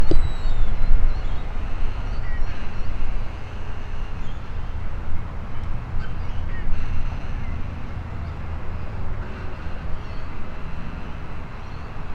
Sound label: weir way; Coburg Lake 2026